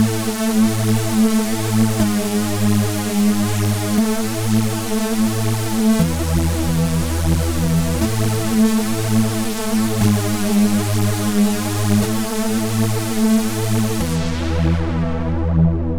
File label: Massive Synth, Chillout; Sonic Foundry (Sony Creative Software); Afterhours EDM; Chillout Service